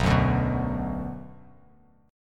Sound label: B Minor B Bass Standard; strummed; JGuitar